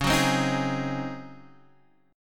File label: C sharp Major 7th Suspended 2nd Suspended 4th C sharp